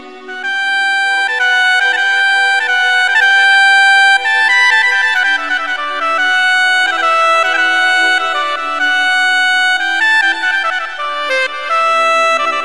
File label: vst sax; melody